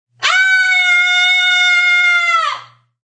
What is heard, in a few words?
Female Yell Ahh, Female horror sounds; Screaming (Sound Effects:Voice)